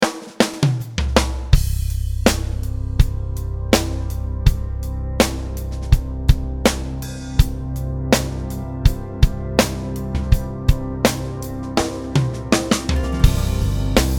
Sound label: Backbeat